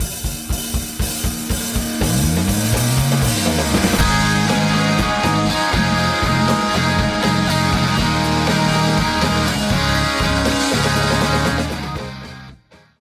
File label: Intense Guitar